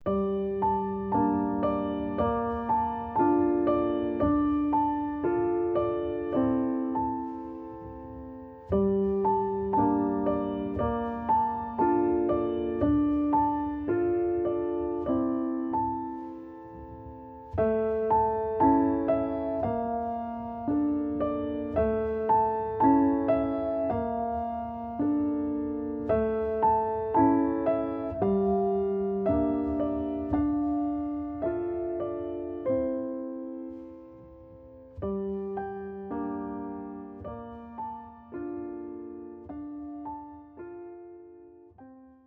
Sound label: (Instrumental)